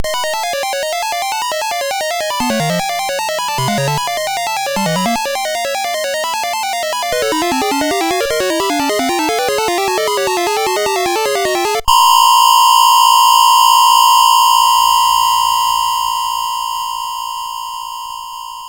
sid MOS6581R2; Nine Princes in Amber

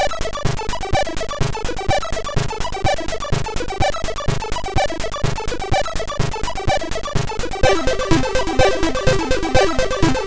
Intro, sid MOS6581R2

